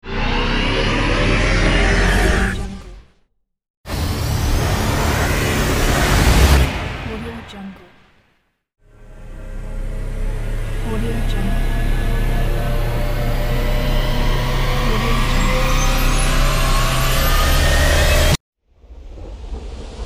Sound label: transition pack